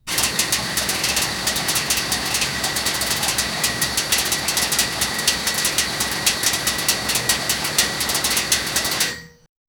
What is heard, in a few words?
metal lathe coolant liquid, machinery